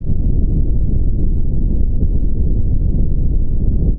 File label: Noise Brown